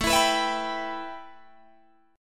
A 7th Suspended 2nd A Guitar Open D, chord

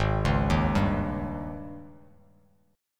G sharp Major 7th Suspended 4th, Bass Standard; 4,4,1,0; JGuitar

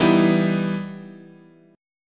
E Minor Major 7th, chord

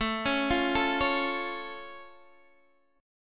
A Augmented A down Guitar Standard; arpeggiated; JGuitar

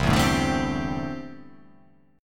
C 6th Add 9th C down

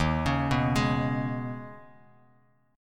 Eb 7th Suspended 4th Sharp 5th, Bass Standard; Eb7sus4#5; arpeggiated; 11,16,9,13; JGuitar

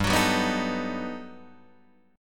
G Augmented Major 9th, Guitar, Open D

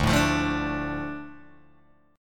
D Minor D Guitar Open G

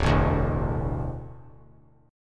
D Suspended 2nd D down; strummed

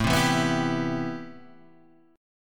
A-Minor 9th A; Am9 Chord {5 3 2 4 5 3} strummed

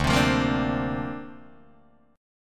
D-Minor 7th Sharp 5th D Guitar DADGAD, chord

